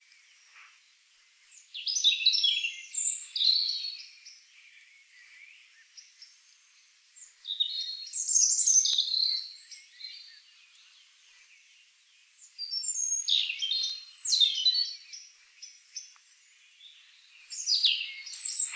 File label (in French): rouge gorge chant